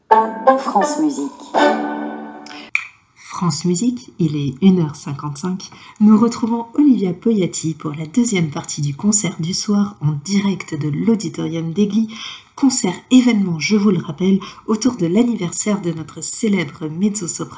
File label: Jingle France musique; Stravinsky